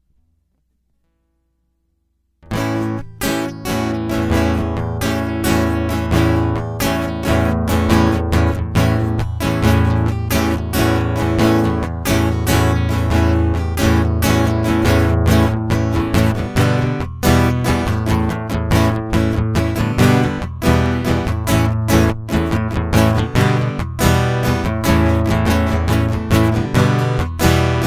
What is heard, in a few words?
Guitar Loop